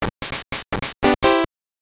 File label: Jingle; tetris blast; sgb